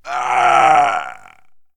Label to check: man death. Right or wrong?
Right